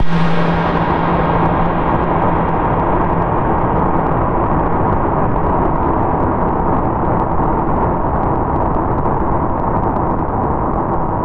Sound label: jumpscare noise